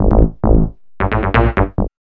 B LOOP; E-Lab; X Static Goldmine 1

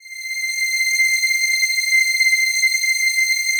PAD, Pads; Best Service; XX Large

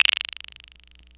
PERC; ZERO-G; Datafile

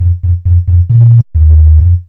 B LOOP; E-Lab; X Static Goldmine 1

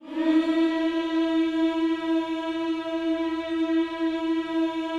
HMMS E 4, gospel; Spectrasonics; Vocal Planet Vol.1 Gospel; akai

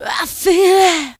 VOX; ZERO-G; Datafile

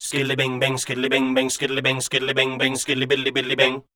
SCAT, Voice Spectral

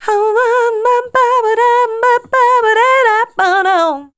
RHY LINE, Voice Spectral; RHYTM

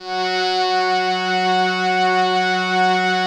Atmo Pads; Best Service; XX Large

